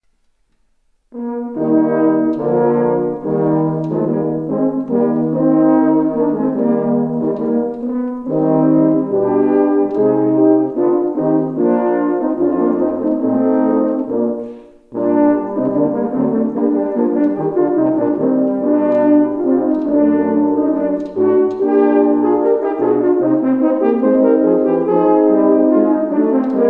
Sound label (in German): Quartettsatz; Bruckner